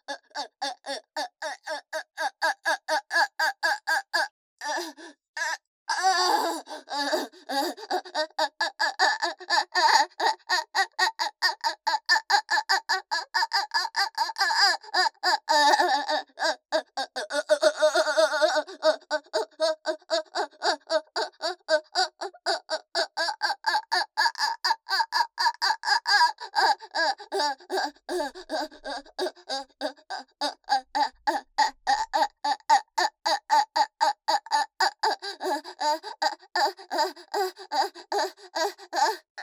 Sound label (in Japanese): 喘ぎ声